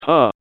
laugh short; John Madden

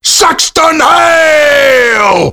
rage; saxton hale

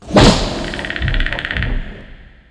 predator perk

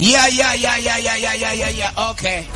yayaya

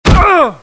death; hl2dm

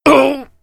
heavy pain severe; tf; duke

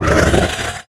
kranagull scream; kraak

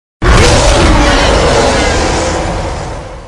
short roar

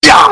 jump; wolf

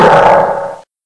bowser hit; freak fortress 2

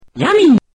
attack; freak fortress 2; mario

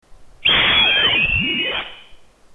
death, zombie

